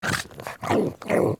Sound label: Puppy Idle Growl Playful; Warframe; Kubrow